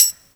soft high hat sample b key, Royality free hihat sample tuned to the B note. Loudest frequency: 6788Hz; Tuned drums